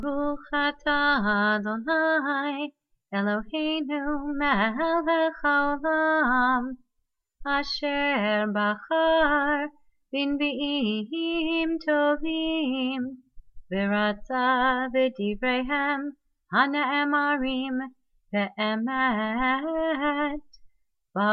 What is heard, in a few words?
blessing before haftarah